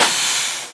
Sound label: steam ATTACK; The Elder Scrolls III - Morrowind